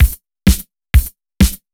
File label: Housebeats 128BPM; Vengeance Samples